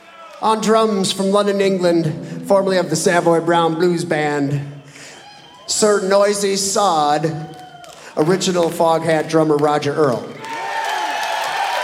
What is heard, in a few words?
bang bang (drum solo)